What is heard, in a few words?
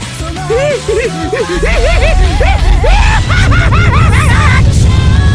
laugh; terumis